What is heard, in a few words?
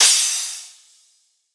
Demonic Crash; Dubstep; Drum Shots; Rides & Crash; GhostHack; Ghosthack - Demolition - Free Dubstep Samples